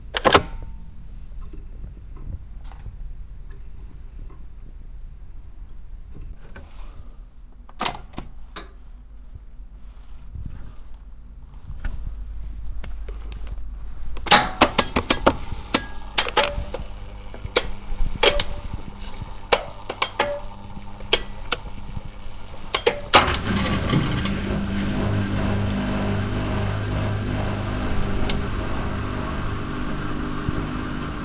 Die Waschmaschine

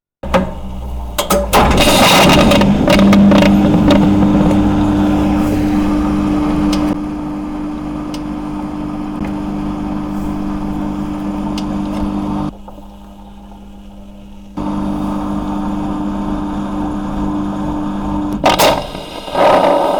Waschmaschine

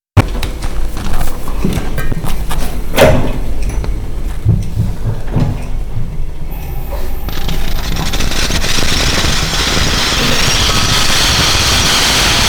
Topf; Fieldrecordings; Cafe in Lissabon

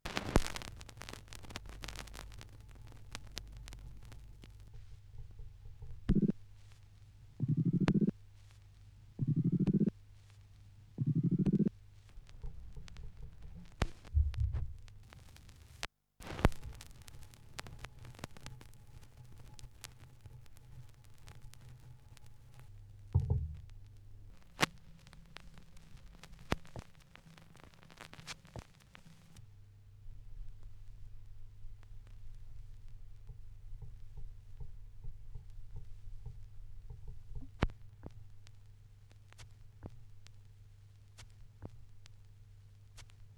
schallplattengeraeusche, Mix

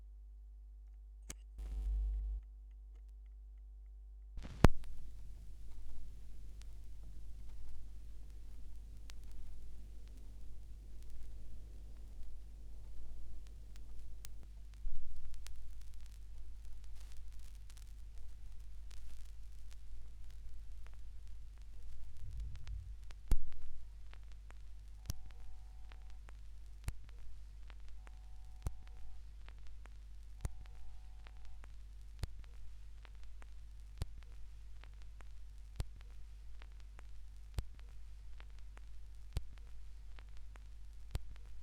schallplattengeraeusche; rauschpartikel

